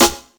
raw snare one shot c sharp key

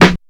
clean acoustic snare sound f key; snare drum samples

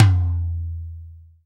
verby tom e key; tom drum; tom drum samples

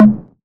mid tom drum one shot g sharp key

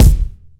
airy bass drum sample g key

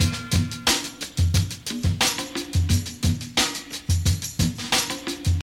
89 bpm 00s drum loop d sharp key

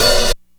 reverb open hi hat c sharp key

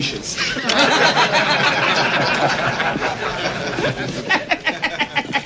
funny sarcastic laughter

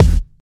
rich mids kick one shot D# key; kick drum

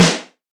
snare drum sample e key

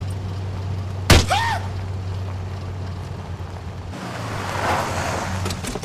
yelps hits and screams with engine; chatter

